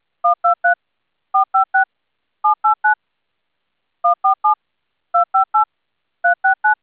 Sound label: dtmf 1 2 3